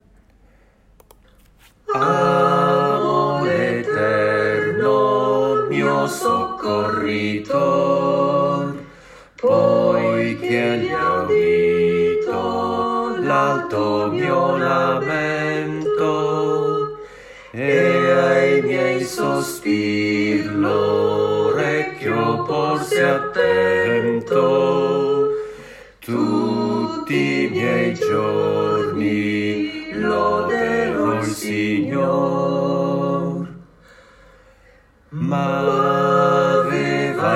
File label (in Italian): Salmo 116 Soprano Tenore e Basso